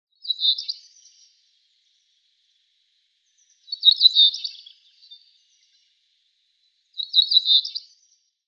Tuerkenammer Emberiza cineracea; Vogelstimmen

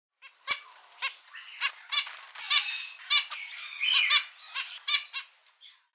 Zebrafink Taeniopygia guttata; Vogelstimmen

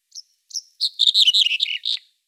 Braunkopfammer Emberiza bruniceps; Vogelstimmen

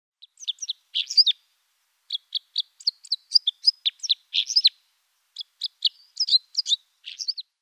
Fichtenkreuzschnabel Loxia curvirostra; Vogelstimmen

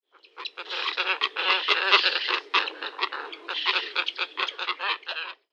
Sichler Plegadis falcinellus, Vogelstimmen

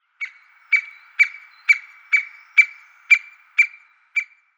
Weissrueckenspecht Picoides leucotos; Vogelstimmen

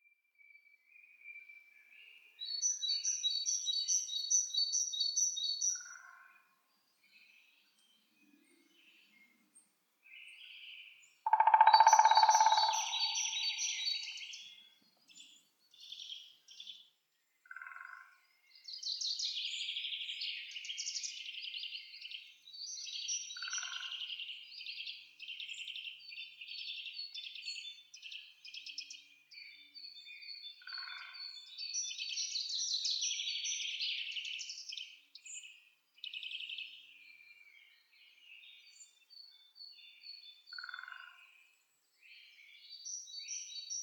Spechte Picidae; Vogelstimmen

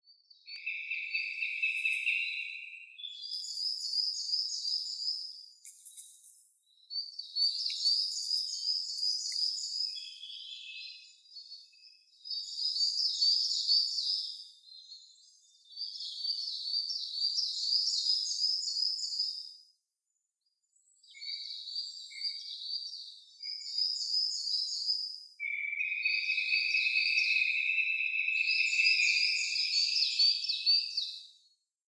Habicht Accipiter gentilis; Vogelstimmen

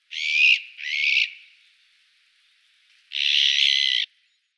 Blauelster Cyanopica cyanus; Vogelstimmen

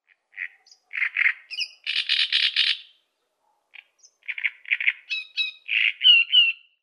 Drosselrohrsaenger Acrocephalus arundinaceus; Vogelstimmen

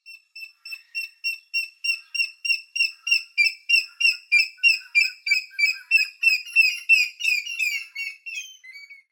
Grautoko Tockus nasutus; Vogelstimmen

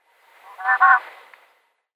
Kapohreule Asio capensis; Vogelstimmen

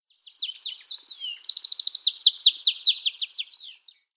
Kanarengirlitz Serinus canaria; Vogelstimmen

